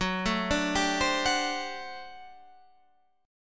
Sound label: G Major 7th Suspended 2nd Suspended 4th Gb